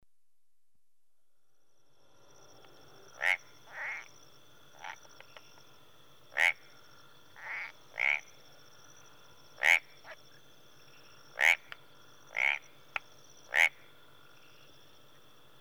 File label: Rwanda- Marsh & Tree Frogs, early evening; The BBC Sound Effects Library; Africa- The Natural World